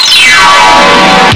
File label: PIANO